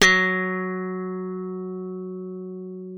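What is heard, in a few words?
slap thumb F#3, bass; Xsample VOL-1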